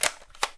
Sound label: Toy Gun Reload